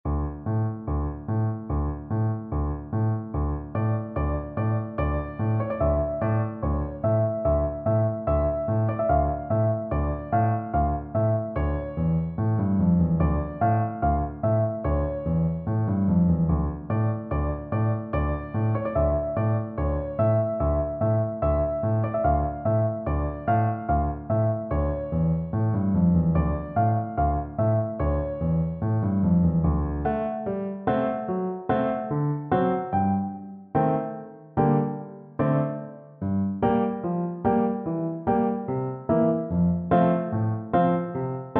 danses des cygnets, Bassoon

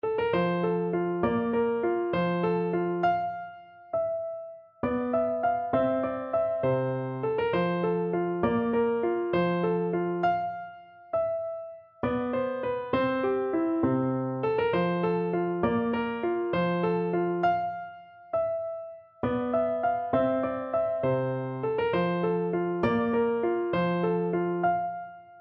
Gigue G Major PNO, piano

